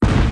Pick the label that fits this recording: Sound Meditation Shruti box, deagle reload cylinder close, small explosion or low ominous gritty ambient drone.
small explosion